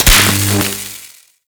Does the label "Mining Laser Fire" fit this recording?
Yes